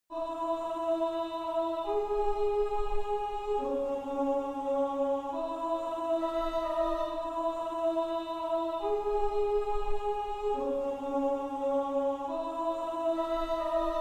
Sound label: 138 BPM C# Min Choir, Loop Stems; Cymatics; 2022 Melody Mystery Expansion